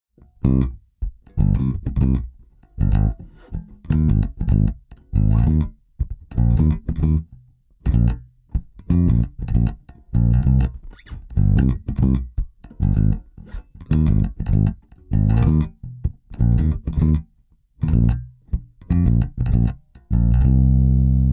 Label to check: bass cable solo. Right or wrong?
Right